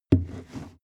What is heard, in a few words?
step wood turn